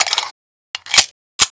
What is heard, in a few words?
reload; colt